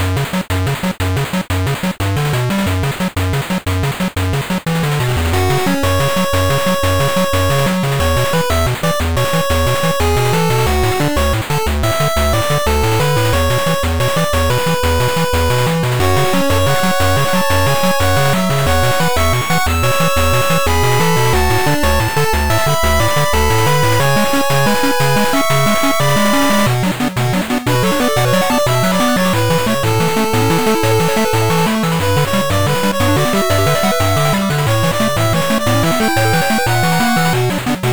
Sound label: 8bit